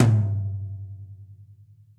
Tom Low Direct Natural, Drum Hits; Standard Hits; Ableton; Factory Packs; Session Drums Studio

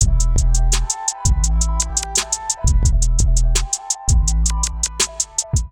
Kit groove 84 bpm, Drum; MIDI Clips; Lit; Essentials; Ableton

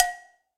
Cowbell Mid Room With A Vista; Drums; Drum Hits; Standard Hits; Ableton; Session Drums Studio

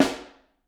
SN Overhead, Snare

